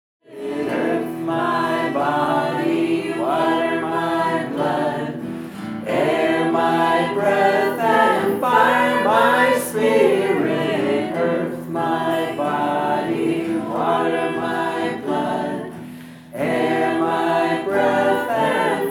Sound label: Earth My Body Melody; Cantos